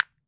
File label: CASTANETS; AKAI S-Series CD-ROM Sound Library VOL-5